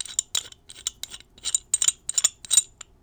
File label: Pipe Wrench; bb - Smol Mechanisms